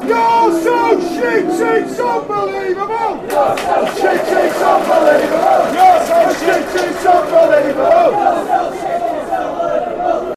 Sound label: youre so unbelievable, chants